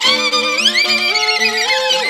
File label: VIOLIN LINES; Best Service; World Colours